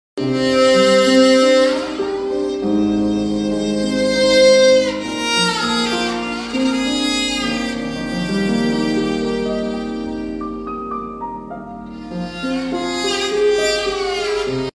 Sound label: Ghijak; تاجیکی